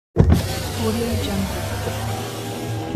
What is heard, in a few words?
car window regulator